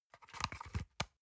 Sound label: paper box small handle soft